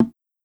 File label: soft hit normal